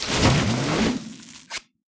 unknocking mate; cstrike; pubg

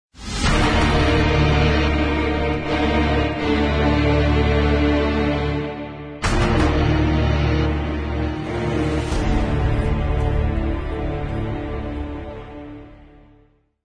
round lose; cstrike; codmw2

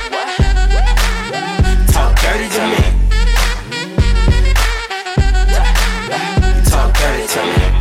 win sound; pubg

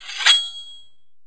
katana knife deploy; cstrike; weapons; knifefun

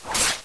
knife stab; cstrike; codmw2

